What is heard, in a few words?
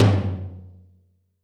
tom, Zoom MRT-3B